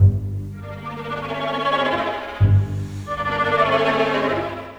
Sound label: Cinematic Strings, Orchestral; Sonic Foundry (Sony Creative Software); Orchestral Series VOL-3; Cinematic 16